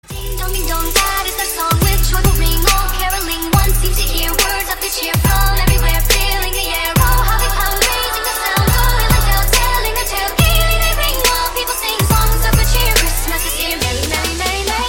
Carol Of The Bells Trap Remix

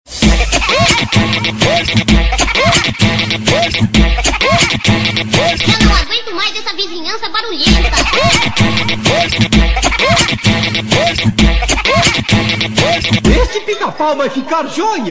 funk; Hacke Hackspett

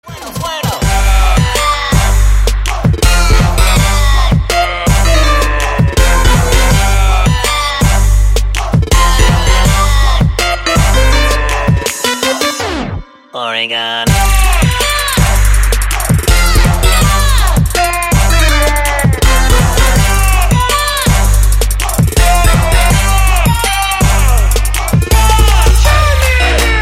Trap Remix; Pokemon Go

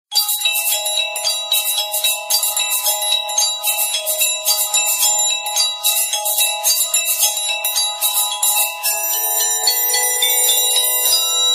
Tone; Samsung Galaxy S3

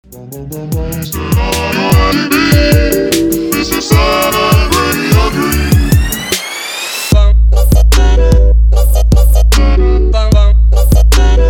Trap Flip

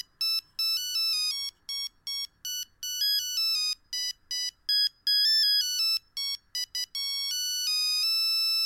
Merry Christmas Nokia

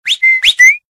Whistle; Streamago

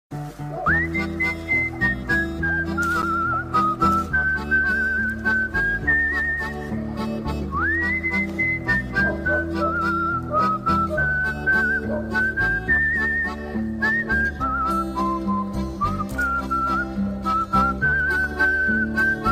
Whistle

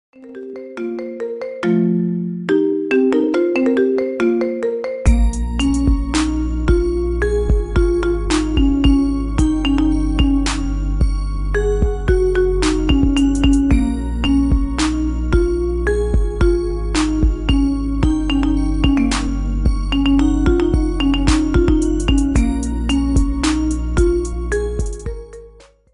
Marimba

